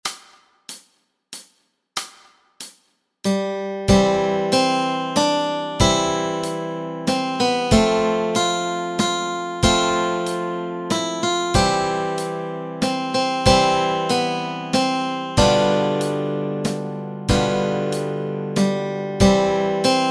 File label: Melody and Chords Track